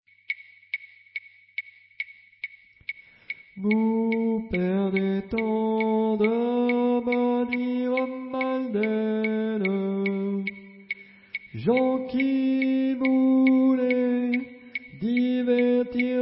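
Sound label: soprano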